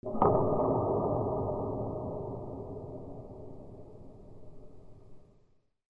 DOORS

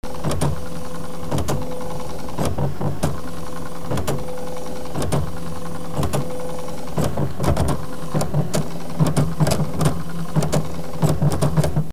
OFFICE

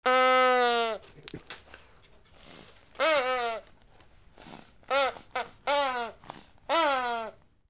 DOGS

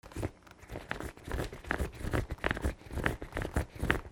FRICTION

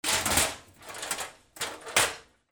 KITCHEN

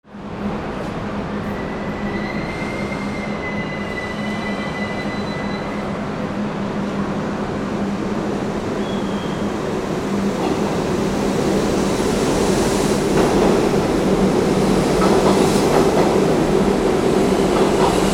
VEHICLES

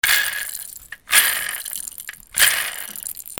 TOYS

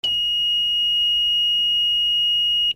ALARMS

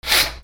PAPER

